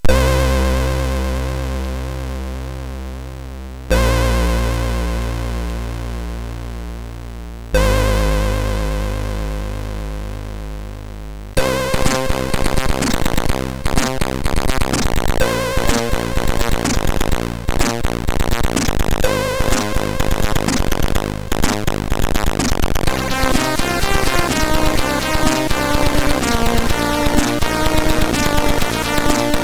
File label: Loader, sid, MOS6581R3; Ocean Loader 4; hvsc